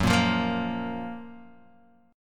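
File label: G flat Major 7th G flat Guitar DADGAD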